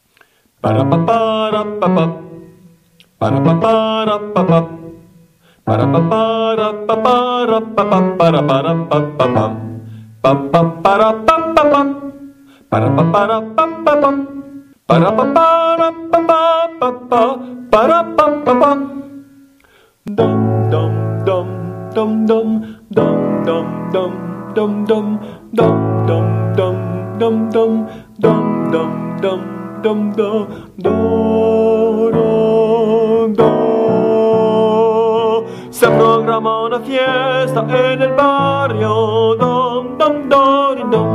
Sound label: candombe